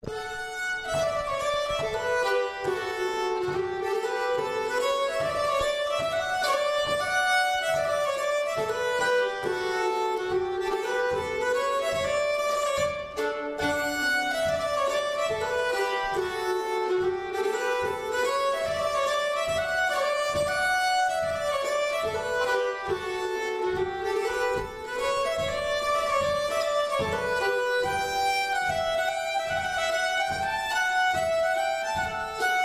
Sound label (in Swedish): schottis